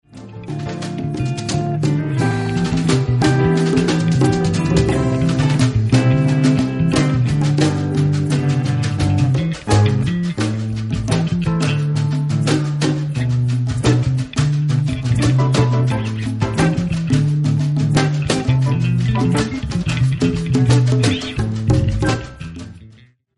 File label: tone